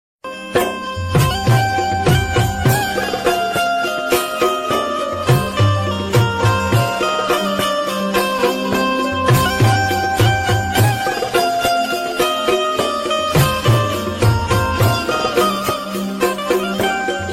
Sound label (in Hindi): Ramdev ji bhajan ringtone